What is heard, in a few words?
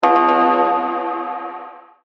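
notification message; Stellaris; ringtone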